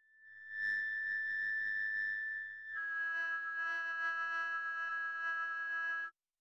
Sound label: keypad successful high pi